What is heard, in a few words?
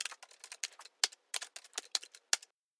Keyboard